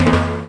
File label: arabic perc, Arabic Percussion Kit